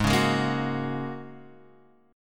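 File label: A flat 7th Suspended 2nd Suspended 4th A flat Guitar Open F, chord; Ab7sus2sus4; strummed; chordshape; JGuitar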